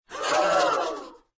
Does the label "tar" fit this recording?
No